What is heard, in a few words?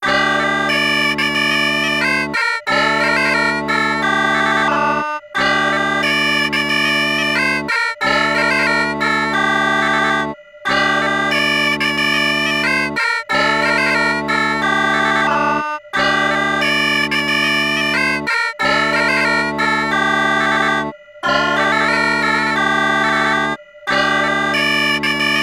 Bigpipes; Talent Studio (N64) (gamerip) (2000); mario artist talent studio